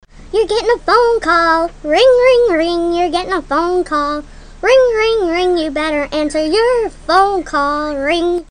cute call

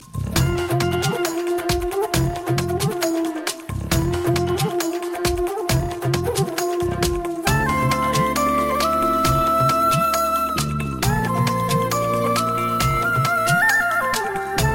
flute; uninor

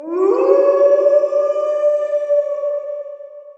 wolf howl

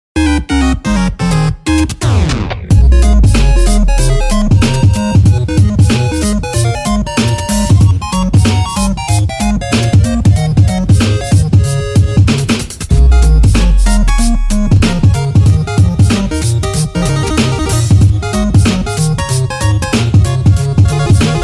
Beat; Super Mario 2

